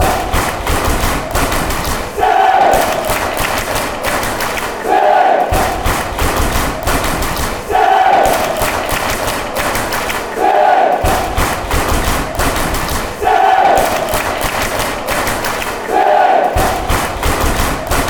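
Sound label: city claps, football songs and soccer chants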